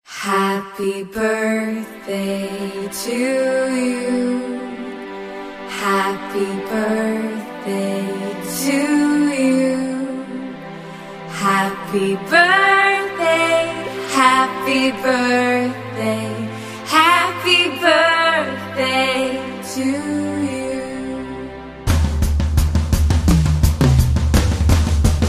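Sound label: remix foreign birthday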